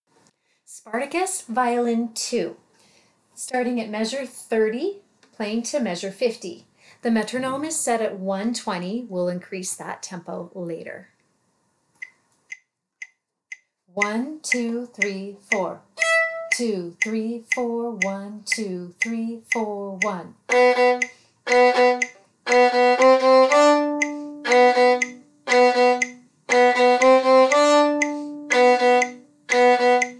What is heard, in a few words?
violin; soartacus